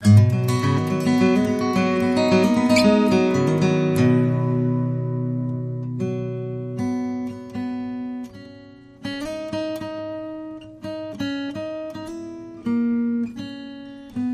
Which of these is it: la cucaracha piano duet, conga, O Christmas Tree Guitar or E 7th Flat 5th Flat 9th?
O Christmas Tree Guitar